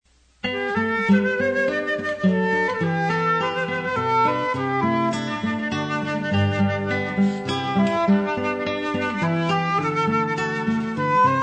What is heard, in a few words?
wind strum duo; Classical